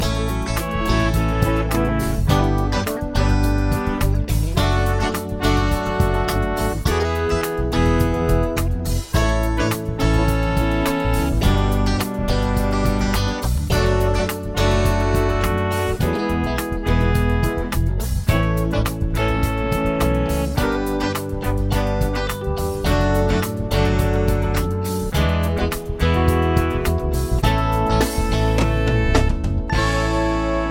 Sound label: chord prog with mixture